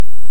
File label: Hydrophone; OneOcean